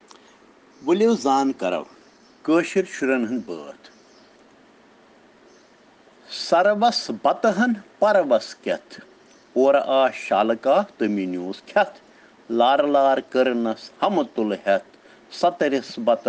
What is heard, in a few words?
Children Rhyms in Kashmiri